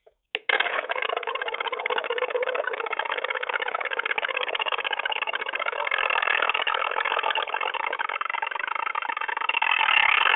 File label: dictaphone recording